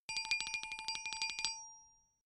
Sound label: glockenspiel tremolo low short